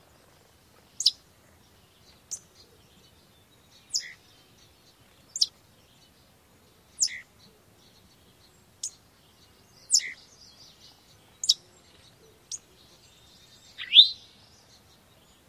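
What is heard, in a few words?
Eastern Towhee chip calls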